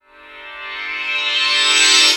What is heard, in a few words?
Uplifter